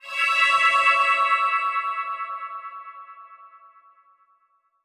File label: Transition Musical Tone Warble; Production Element; Title Transition; AdobeCC